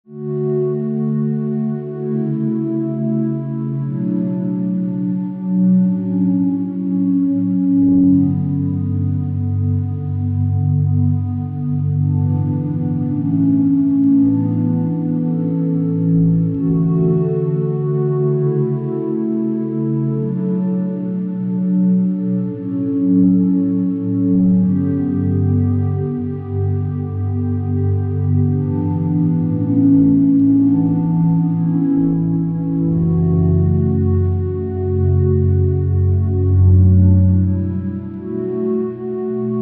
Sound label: Underscore PAD; Worship Flow